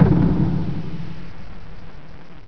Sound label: HIT; Teracide